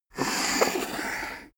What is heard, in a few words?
poker chip slide table long